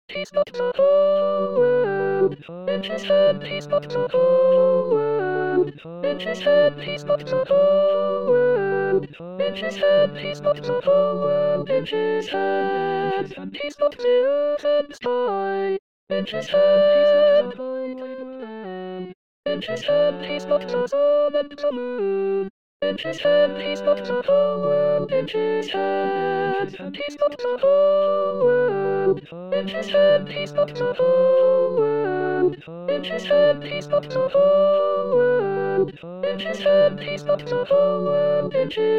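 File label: He's got the whole world Sopranos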